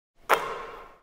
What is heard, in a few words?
swish, basketball ball